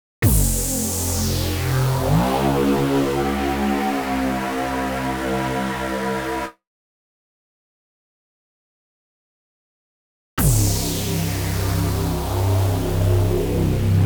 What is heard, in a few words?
Insert and Master Effects